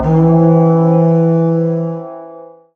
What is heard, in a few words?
alarm alert